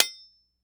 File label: Sword Hit Cinder Block, weapons; AdobeCC